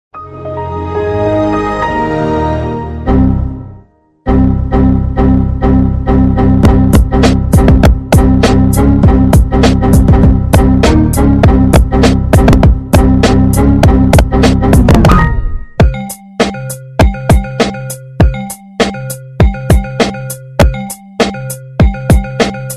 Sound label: error ringtone